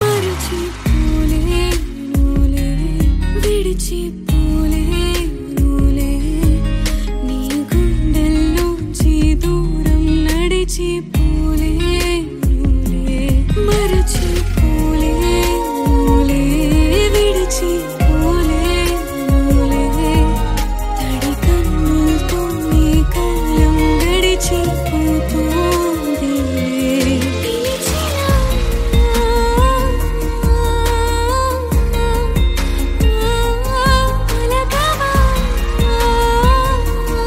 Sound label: Female Version; Telugu